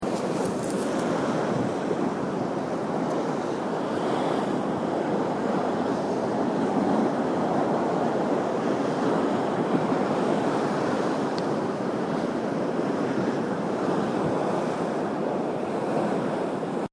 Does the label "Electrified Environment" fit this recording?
Yes